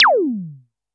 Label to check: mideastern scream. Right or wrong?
Wrong